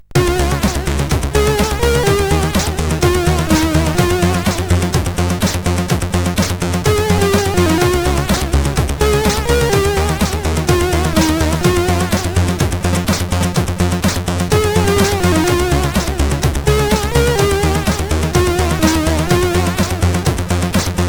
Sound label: Outrun Remix, CSG8580R5